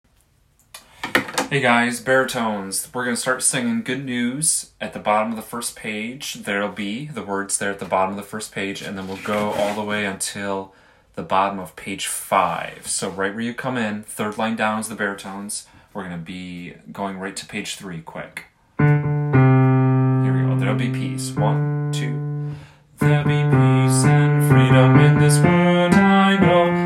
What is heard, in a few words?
good news baritone